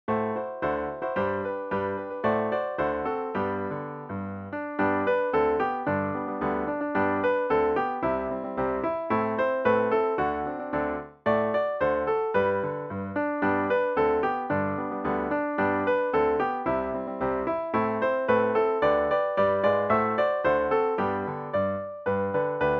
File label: jingle bells